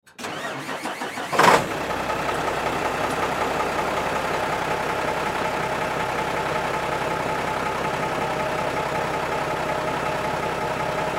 Semi Truck Mac, Semi Truck Mac E7-460 Start Up Drive Off; Trucks; Industry; Blastwave